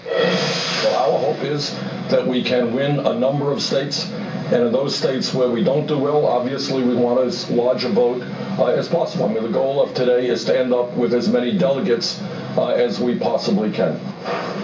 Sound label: Fighting